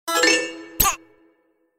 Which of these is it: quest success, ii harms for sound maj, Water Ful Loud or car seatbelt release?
quest success